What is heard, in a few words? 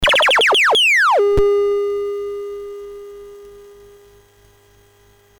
MOS6581R4, GAMES; Tron BASIC; HVSC